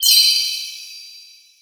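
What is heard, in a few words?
Sound Effect Enemy Tower Flash; Se Enemy Tower Flash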